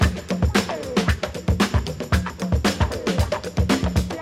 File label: 114 bpm high quality drum groove d key, Free breakbeat sample - kick tuned to the D note